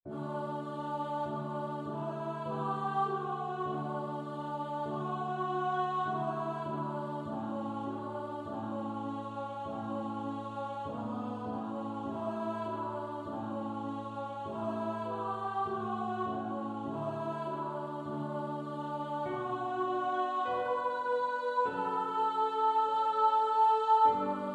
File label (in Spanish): CONTRALTO; accion gracias